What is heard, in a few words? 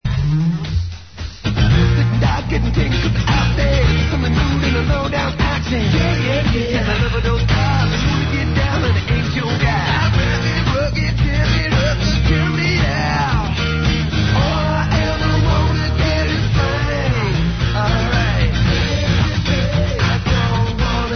slang acoustic